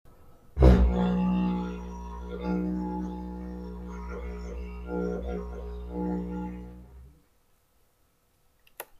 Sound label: Didge